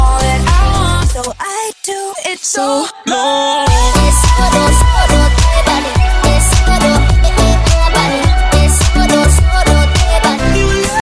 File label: solo electro ringtone